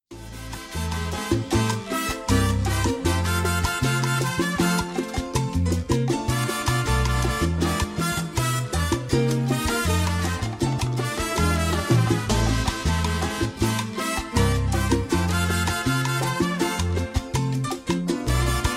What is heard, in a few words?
Pop Latin Ringtone